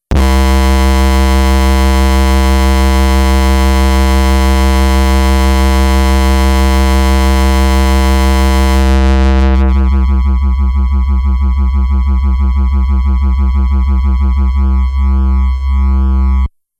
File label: grinder